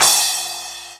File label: Splashy, E-MU Xtreme-Lead